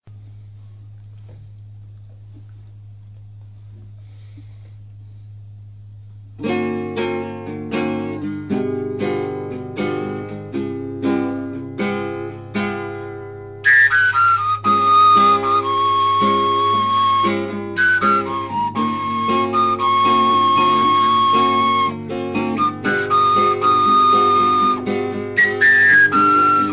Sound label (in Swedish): krummelur gitarrego